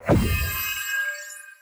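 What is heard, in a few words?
spell defense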